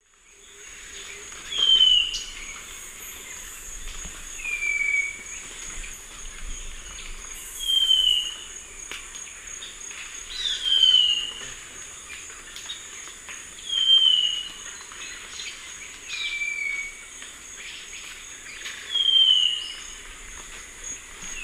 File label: Hylophilus ochraceiceps, duet; S. America; Eastern Lowlands